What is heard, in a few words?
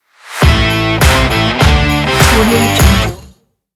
powerful guitar logo